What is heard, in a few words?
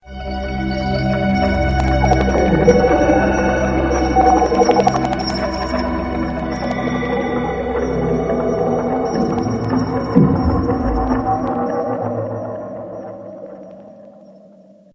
abyss